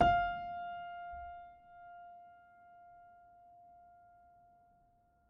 Upright Piano, Sustains, F4; Knight; Chordophones

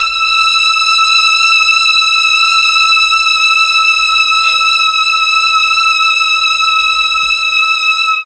E4 16 violin, mellotron; TRON 16VLNS

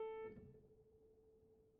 release A3, Upright Piano; Chordophones; Knight; Releases

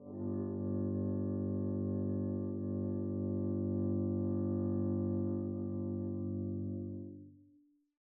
arco g1 p up, double bass; dsmolken

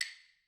Claves Hit

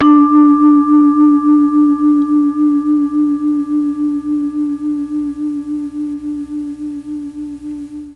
D2 vibes, mellotron MKII

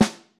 snare ff, Old Snare; Membranophones; Struck Membranophones; Legacy Snares

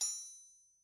Anvil Hit, Struck Idiophones

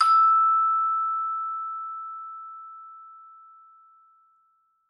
Vibes hard E5, Vibraphone, Hard Mallets; Struck Idiophones

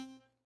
Hohner Special20 Soft rel C3, Harmonica, Releases; Aerophones; Free Aerophones

